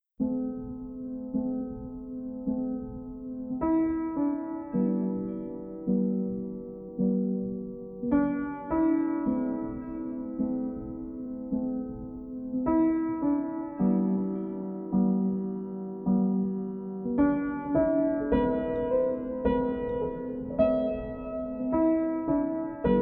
pozytywka, 432 hz

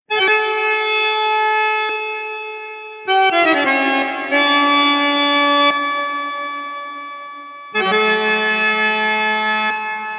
duet akordeonowy toccata 432 hz; bach

